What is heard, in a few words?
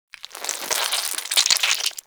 Acid Squelch; Ambience; Bromius Inside